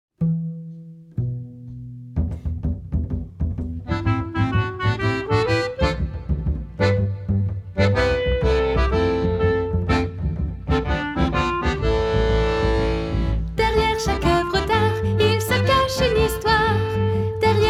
voix principale; barouf; barouf au musee

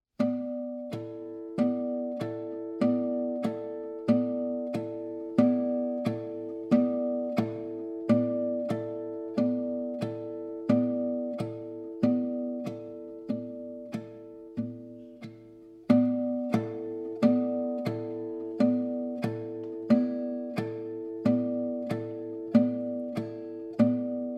instrumental

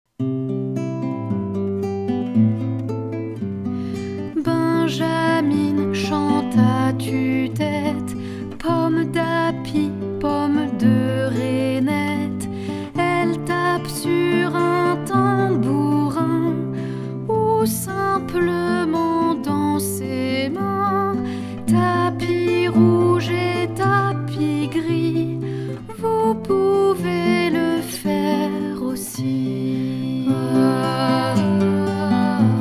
comptine

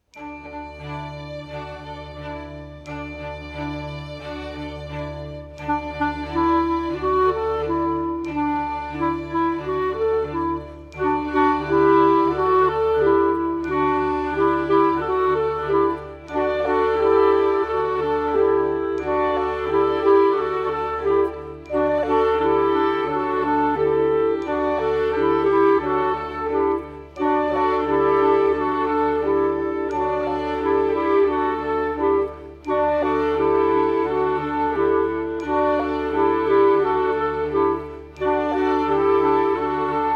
clarinette coda; petit prince

